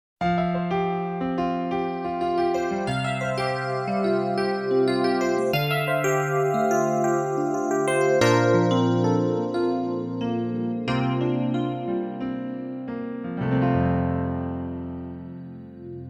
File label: CFX FM EP; Montage